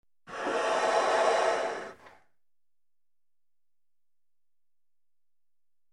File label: Heavy animal breathing